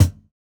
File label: Kick, jungle breaks drum sounds